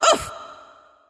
Wound; Julianne

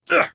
Wound; Valanar

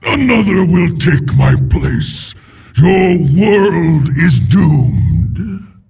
Death; Jaraxxus

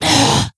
Wound; Tenebron

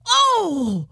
Wound; Creature; Auriaya

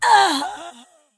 Wound Crit; Keristrasza

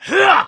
troll attack; Halazzi

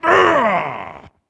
Wound Crit; Captain Rupert

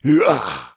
Attack; Voljin

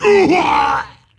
Wound Critical; Jaraxxus

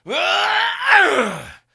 Attack; Keleseth

